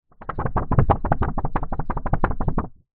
foley paper thick sheet wobble

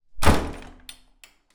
door close wooden bathroom hard key in door swing; household; zapsplat

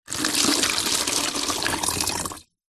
household water pour into plastic funnel

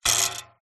battery compartment spring ping; household

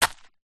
footstep single sandal woods ground stoney path; zapsplat; foley

